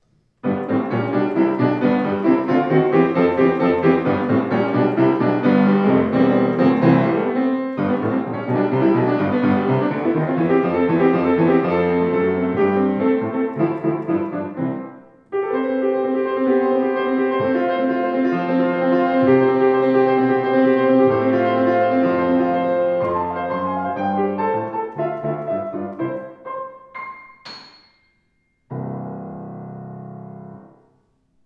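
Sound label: Yamaha C3 Rag, flügel; 186cm-c3-fluegel-2003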